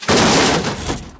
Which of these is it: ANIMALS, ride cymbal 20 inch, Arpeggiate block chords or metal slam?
metal slam